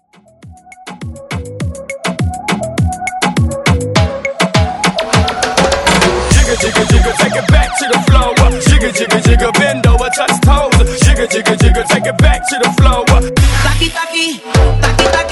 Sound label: Moombah Mixshow Hype